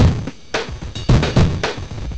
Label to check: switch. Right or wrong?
Wrong